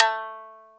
Shamisen A2; Multisamples; Digital Sound Factory Planet Earth

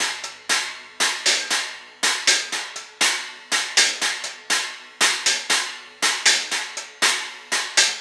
Cymbal Pattern; Sonic Foundry (Sony Creative Software); Essential Percussion

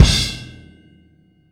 Boogaloo - Outro - Kick, 82 BPM; Sonic Foundry; Drums from the Big Room The Mixes

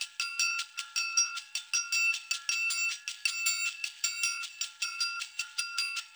Ice Brush; Spectrasonics; Liquid Grooves 1 & 2

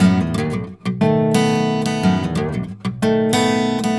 120 BARI RIFF, Blues Guitar; ILIO; Fretworks

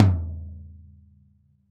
RAW TOM, Drums Acoustic; ALL TOMS; USB Soundscan vol.10; AKAI; 90s sample CD

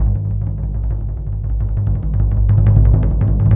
125 Daiko Rolls; Asian Daiko; Spectrasonics; Supreme Beats; World Dance

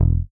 P BASS MUTES

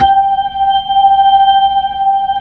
B-3 Hollow; Keyboards of The 60's and 70's; B-3 Hollow Ch

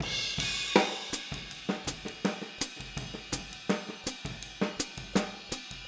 Boogaloo Chorus Hi-Hat, 82 BPM

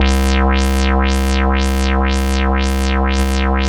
BASS C4 R, Jungle; JGL BASS; USB Soundscan vol.05; Explosive Jungle; AKAI; 90s sample CD

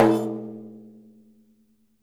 DAIRES OPEN, Mediteranean Traditions percs

